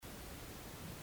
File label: Boiler room thump; Erebus